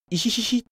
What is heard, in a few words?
ihihihi; kagami hayato